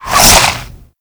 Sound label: Whip Whoosh; Warframe; Weapons; Infested